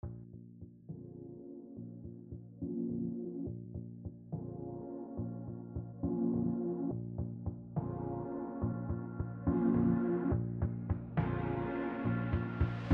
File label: Not Jazz but Sexy